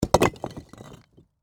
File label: Impact Rock Drop, Heavy, On, Rocks; Materials